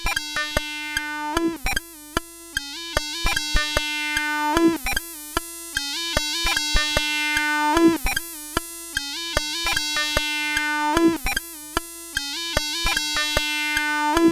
sid CSG8580; Acid Dreams

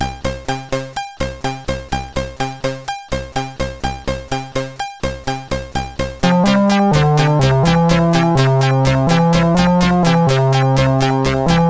Small Tune sid CSG8580R5; hvsc

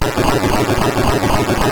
Boing, MOS6581R4; hvsc

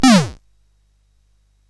Shuffle, CSG8580R5

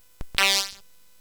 Shuffle, CSG8580R5

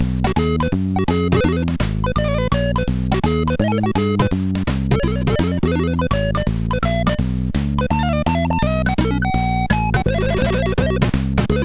Organ Blues, MOS6581R4; sid

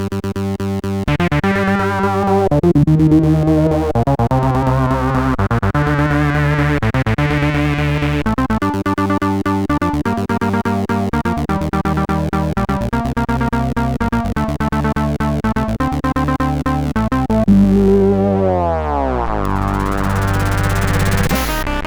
Remix sid CSG8580R5; hvsc

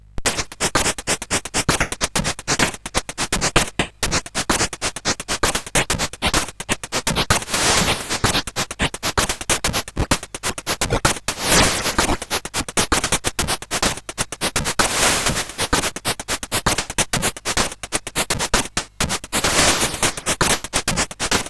menus, sid CSG8580R5; Games Summer Edition

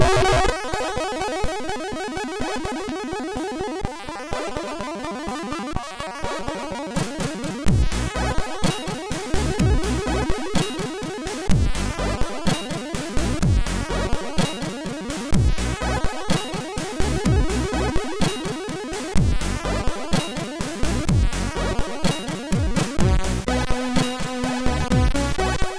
sid, MOS6581

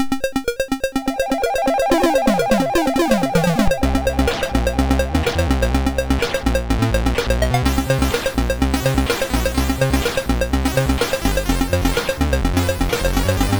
Subbuteo, MOS6581R3

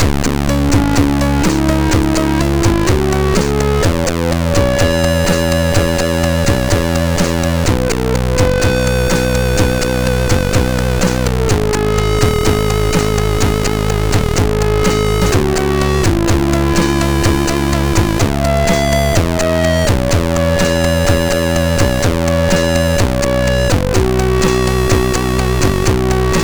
Panflute, MOS6581R4; sid; Panflute Magic

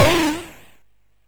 SFX, MOS6581; Narco Police; MOS6581R3

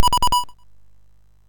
Dragons Den, MOS6581R3

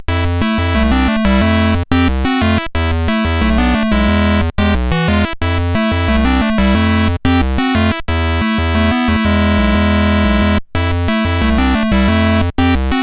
sid CSG8580R5; Perry Mason Mandarin Murder

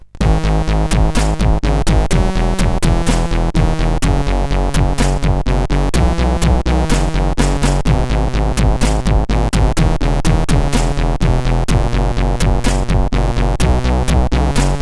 Slimy Depression, CSG8580R5

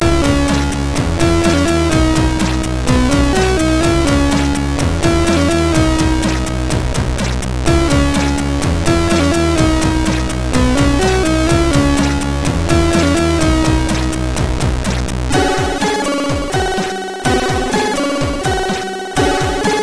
Sound, MOS6581R3

